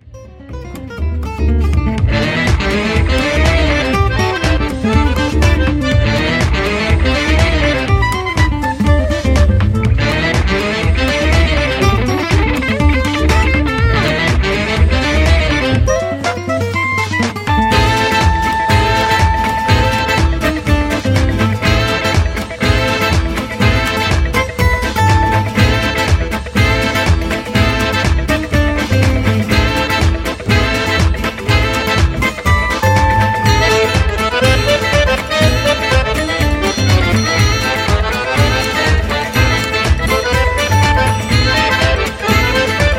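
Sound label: cabaret swing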